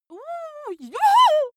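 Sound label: female scared comic, screams; schreie; menschen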